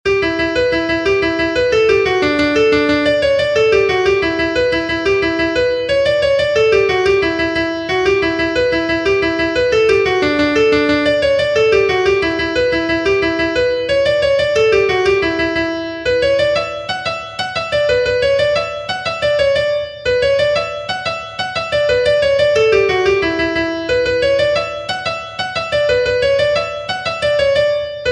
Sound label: The Swallowtail Jig, musique traditionnelle, Irlande